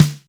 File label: FAT SN; GM DRUMKIT